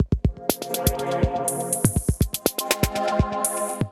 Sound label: Tokyo Sound Kit groove 122 bpm; MIDI Clips; 122 - 130 bpm; Glitch and Wash; Ableton